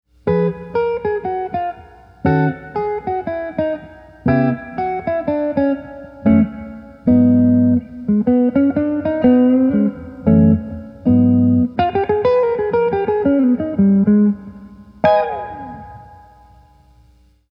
jazz; frv-1 tone demo

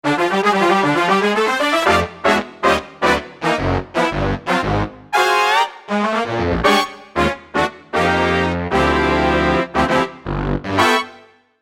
brass; fantom-g live set 14 1st call brass

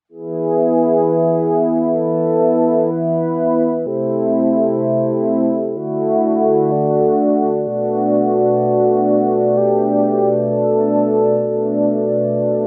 Choir

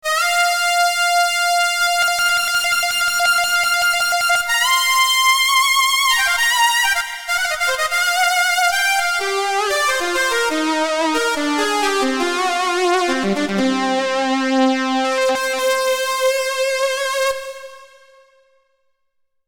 vc 1 pressure me lead

